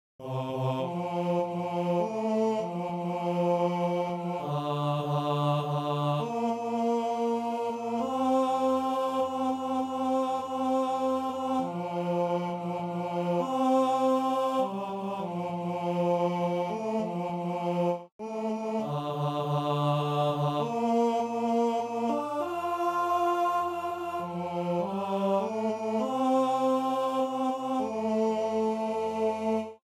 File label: Tenor